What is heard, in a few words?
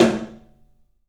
TOM, 3D Percussives